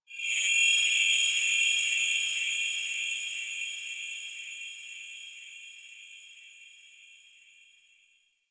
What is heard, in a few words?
Texture Distant, Ambience; Warframe; Cephalon Weave; DE